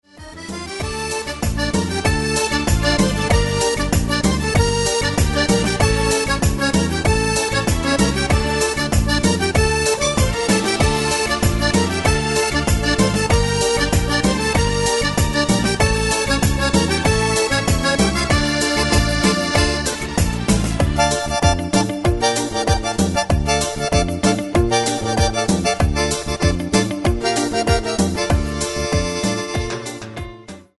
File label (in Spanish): Cumbiando